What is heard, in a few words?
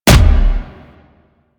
Explosion Punch Generic Large; Warframe; Weapons